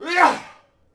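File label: Warrior Attack Vox; Civ4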